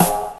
shiny snare one shot G sharp key, snare tuned to the G# note. Loudest frequency: 3173Hz; snare drum samples; one shots